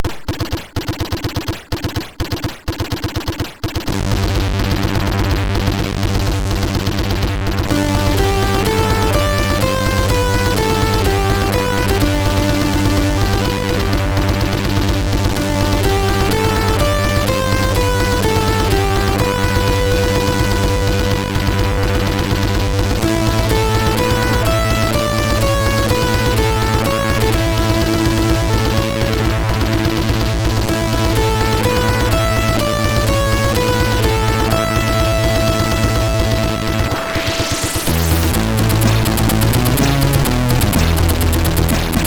War Music, MOS6581R3; SoedeSoft

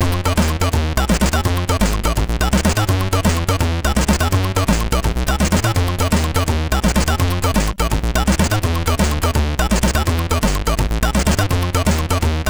Children Songs, MOS6581R3

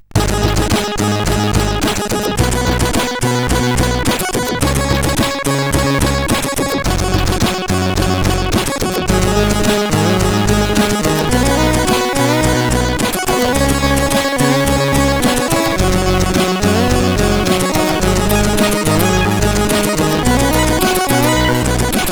Simple Music; SoedeSoft

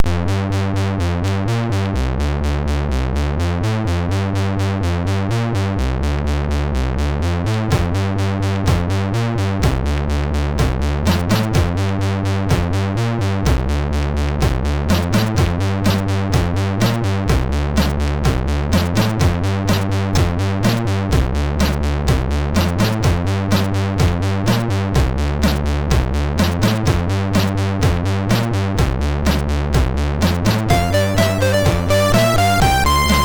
sid, MOS6581R2

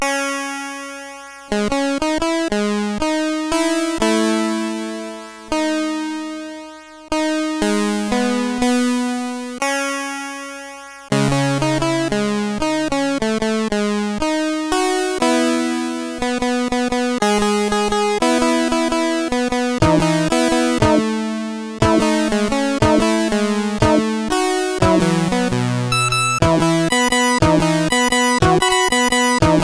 Mini Raiden Cover sid CSG8580R5

